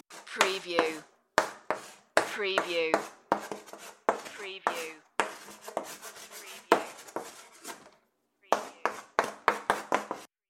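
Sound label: Chalk Black Board; classroom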